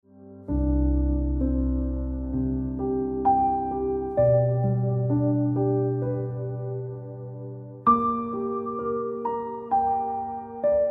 Ringtone Piano Music

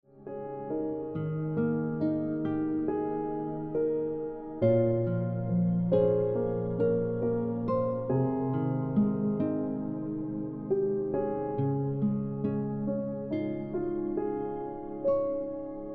Ringtone Piano Music

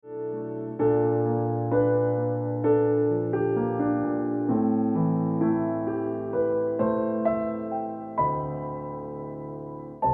Ringtone Piano Music

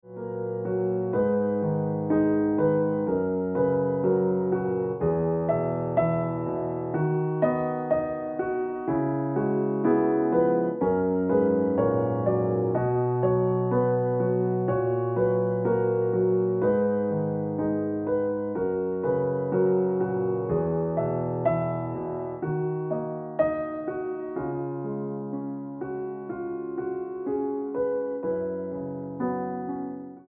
Ringtone Piano Music

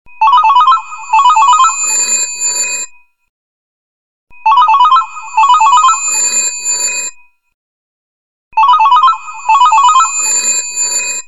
Mixed Phone Ringtone

